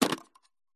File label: household gaming video game cartridge console extract